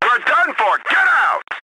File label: death